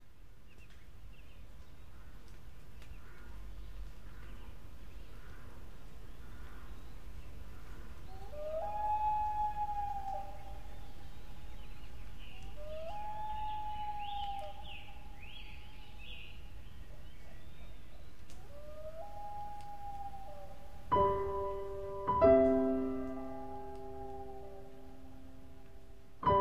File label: Loons piano prominent